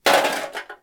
throw away can

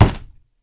hit desk; knocking

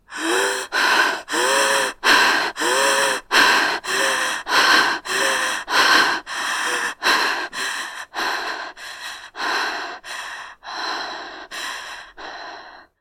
women hyperpnea; horror

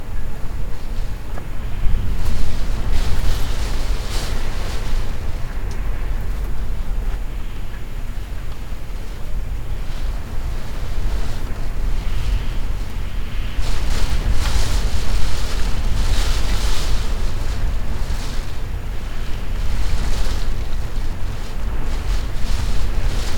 storm and window

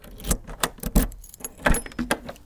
entering a house

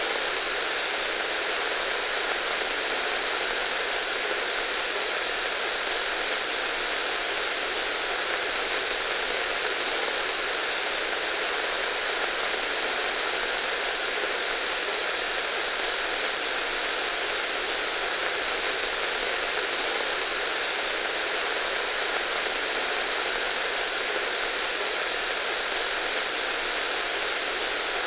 radio noise

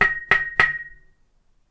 knock on iron tube; knocking

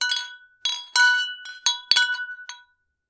wine bottles; 瓶のぶつかる心地良音

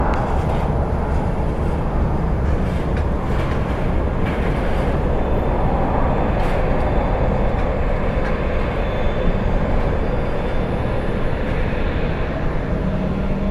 Haneda ap obs deck; 羽田空港第１T展望台２; tokyo

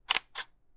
camera shoot

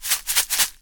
sifting on a rice; eating

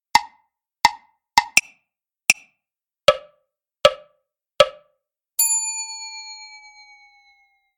japanimation; anime

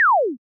laser; arms

